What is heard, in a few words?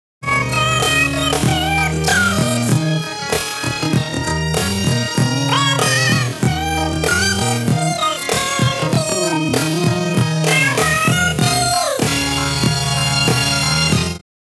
IK SampleTank pitch shift 12